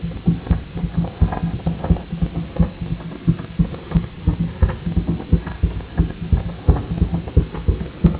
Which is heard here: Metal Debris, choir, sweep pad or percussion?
percussion